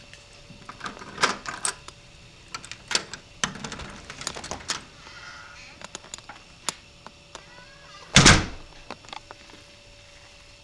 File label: door